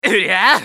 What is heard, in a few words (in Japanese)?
攻撃; アンドレ